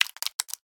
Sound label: horror guts bloody splat squelch in plastic bowl; sound effects one